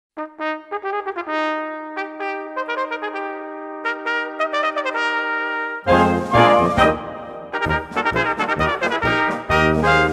Trompetenspiele

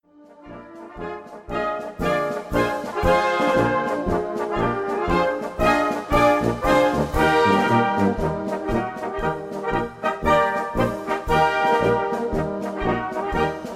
Marsch

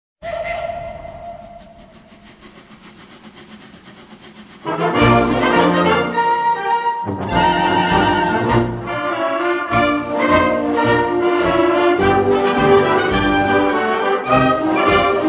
Zillertaler Dampfzug Marsch

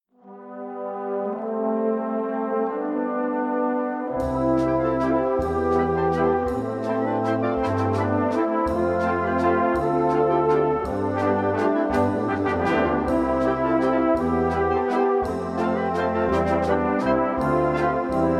Walzer

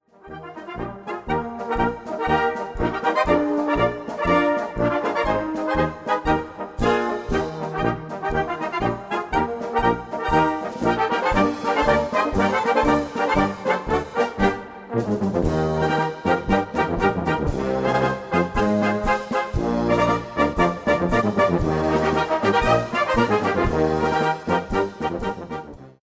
Marsch

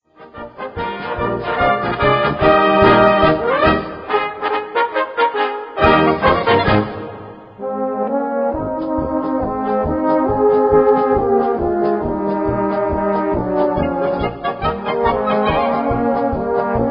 Polka

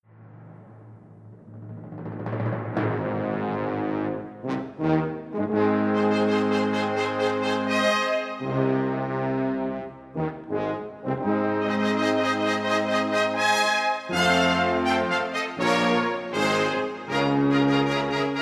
Tiroler Festfanfare

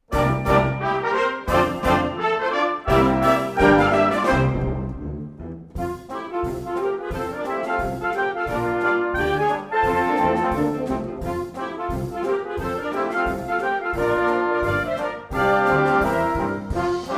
Jubilaeumsragtime 110

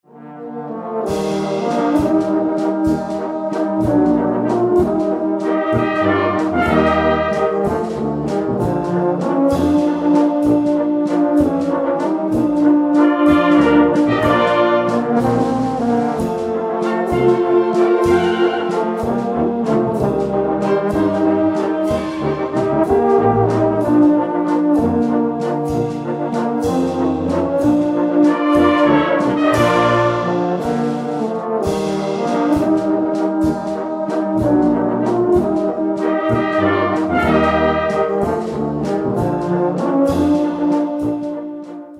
Tiroler Tenorhornwalzer

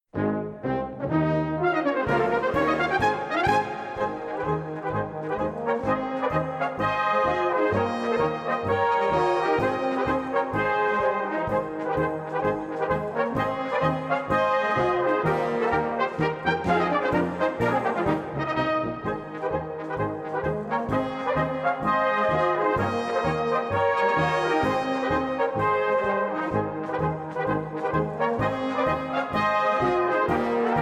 Marsch

